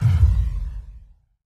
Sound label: Space Ship Laser Hit